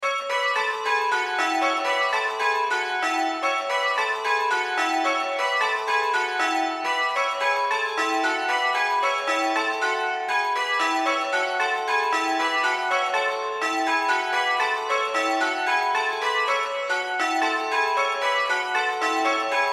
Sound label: church bells, saxthorpe st andrew, norfolk